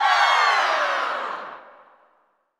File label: CHO FALL, CHO Falls; Sound & Vision - Gigapack I CD 2 (Roland)